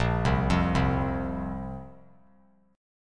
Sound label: A sharp Suspended 2nd, down; arpeggiated; JGuitar